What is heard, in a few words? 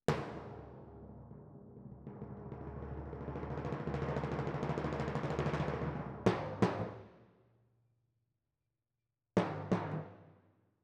Timp Close